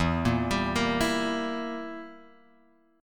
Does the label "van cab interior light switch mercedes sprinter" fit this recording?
No